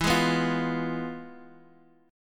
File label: F Diminished E; Fdim/E strummed; JGuitar